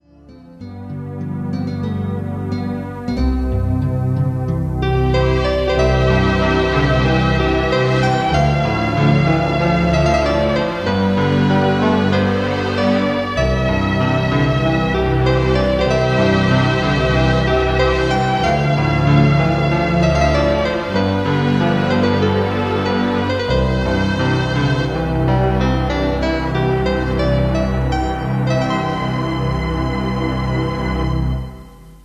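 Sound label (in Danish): klaver strygere Midi Keyboard; AWE32 SF; Hjemmelavet Fra Gamle Kasettebaand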